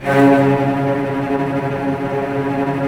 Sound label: STR VCS; Roland - LA Composer vol. 4